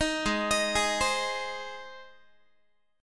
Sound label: G sharp Major G sharp Guitar, chord; arpeggiated; 18,16,18,18,16,x